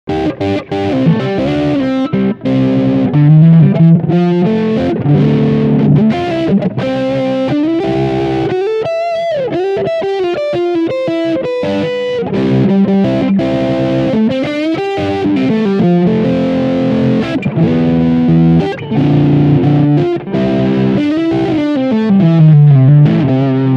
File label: Lick Front Humbucker